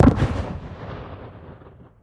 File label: combat, ambient combat far; hl2